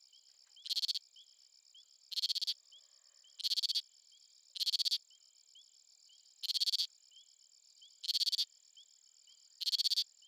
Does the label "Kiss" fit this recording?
No